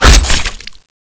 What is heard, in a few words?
knife hit wall; weapons; cstrike